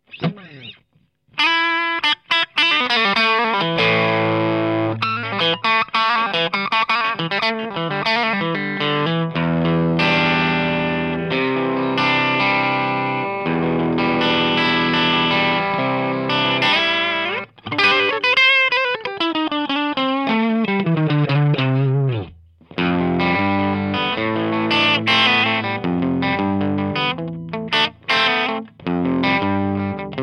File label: 20w crunch